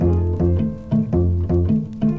bass stereo separation; Loops; Phonky

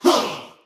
huh group of men; ooh ahh; heh huh

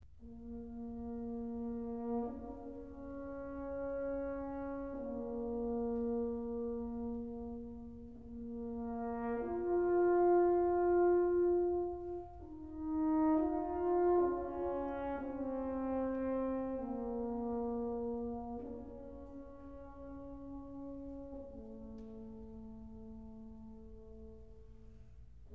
horns, Sad

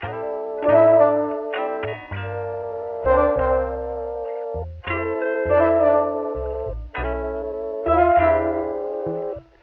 keys guitar in a tin can; Loops; Phonky

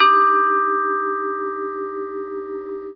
vibraphone

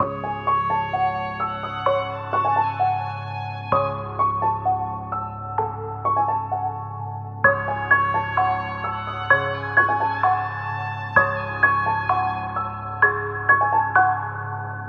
piano, Loops Sad

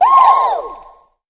woo

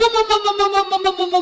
muh muh muh muh muh muh muh muh muh, voice; ooh ahh

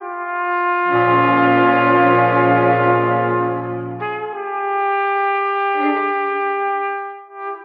horns, sad loops

